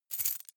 key ring large rattle air soft; objekte; schluessel

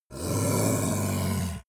yeti growl aggressive; kreaturen

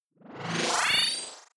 sci-fi boot, swooshes swishes

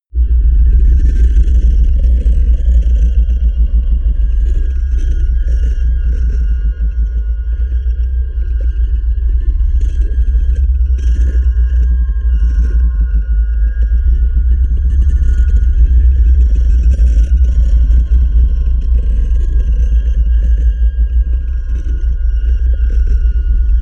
electric earthquake, pads

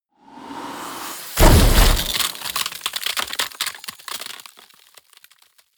ice ball hit crackle; whooshes rises

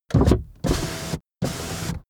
car window front open unsteady

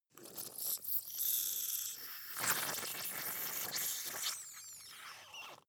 bubble over sizzle; gastronomie; berufe

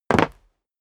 step sneaker concrete jump

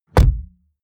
car bmw e46 interior door rear close hard; Cars

